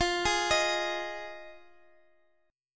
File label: F Minor 7th, Guitar Drop D; down; chord shape